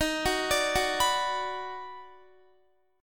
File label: D sharp Minor Major 7th Sharp 5th D sharp down Guitar; arpeggiated; chord